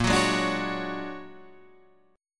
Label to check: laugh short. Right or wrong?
Wrong